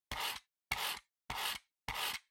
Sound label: PC-Mouse-Move (Hit)